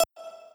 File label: lead